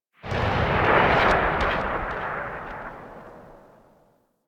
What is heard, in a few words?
Rifle Tail Ext; Weapons; Warframe; Thanotech